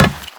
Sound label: Run Metal, Footsteps; Warframe; Teshin